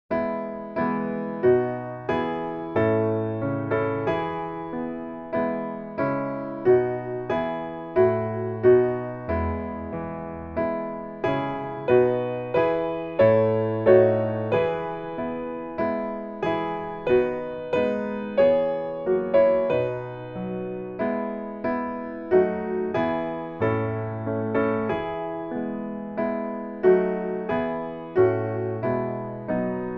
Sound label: piano